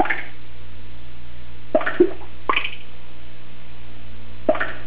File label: drip